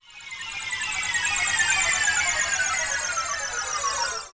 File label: warp song in; OOT